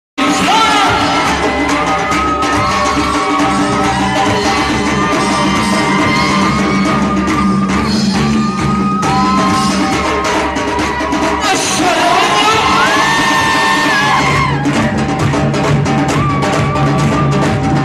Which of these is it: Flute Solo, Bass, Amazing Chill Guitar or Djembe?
Djembe